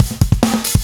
Fill 140 BPM

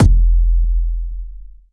Soft Kick; Vengeance Samples

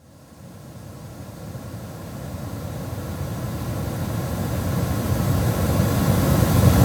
140BPM Breath; FX Fills; 4Bars; Vengeance Samples

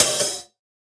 HiHat; Ghosthack

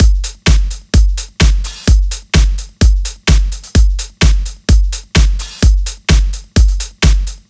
128BPM Drums; Vengeance Samples; Query Kit

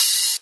Ride, Cymbals; Vengeance Samples

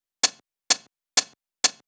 Closed Hihat, 128 BPM; Rap; Vengeance Samples; Vocal-Hook Kits; Song Kit

